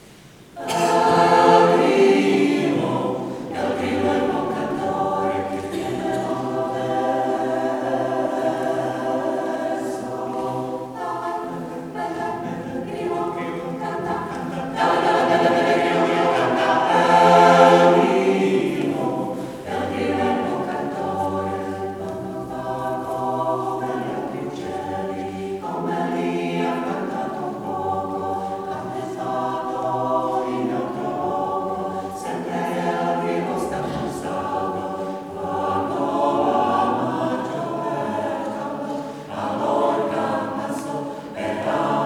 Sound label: El Grillo